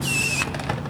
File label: Skate Park Skateboard, percussion; HOUSTON; Jamail